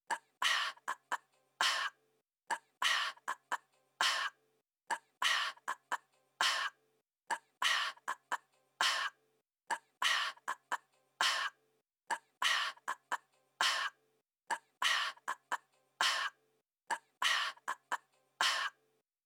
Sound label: 100 bpm